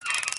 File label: Turn Crank